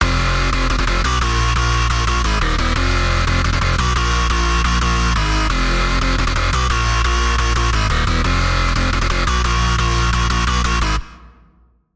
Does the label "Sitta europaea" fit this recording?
No